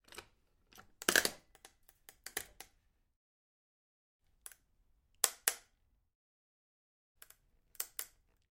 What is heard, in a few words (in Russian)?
zvuki shchelkunchika